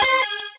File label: normal hit normal